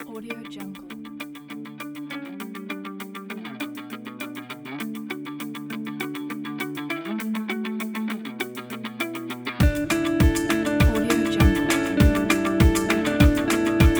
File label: upbeat fun indie rock